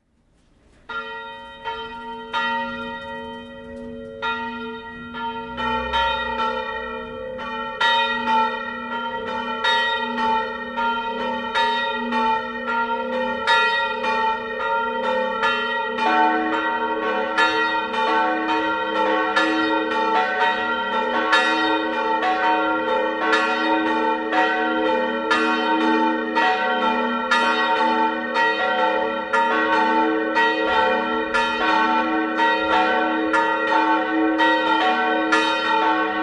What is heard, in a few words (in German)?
Kottingwoerth, Glocken